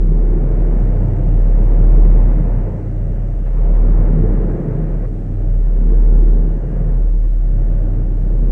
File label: Loop Large Airy Room